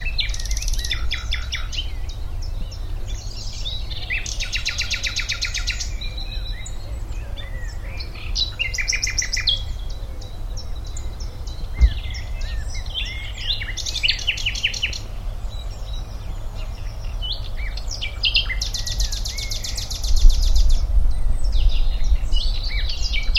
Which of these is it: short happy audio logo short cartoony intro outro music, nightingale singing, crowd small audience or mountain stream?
nightingale singing